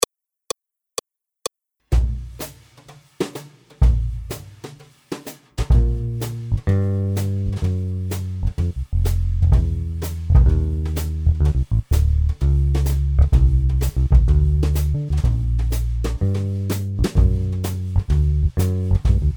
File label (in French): Accompagnement seul